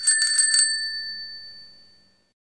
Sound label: Bicycle bell; Fujitsu F-02J